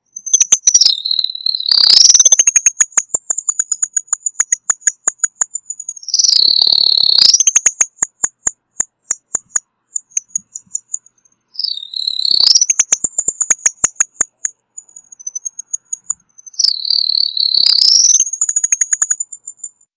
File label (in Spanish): remolinera